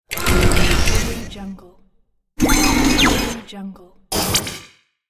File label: scifi display switches